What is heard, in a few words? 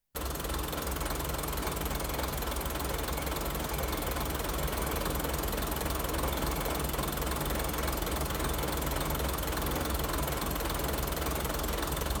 Diesel Trains Local railcar; BBC Sound Effects Library